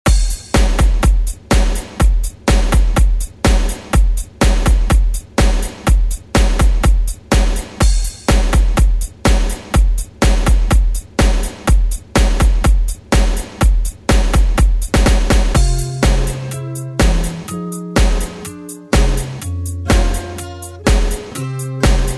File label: Hit Rock Redrum; Deejay Hit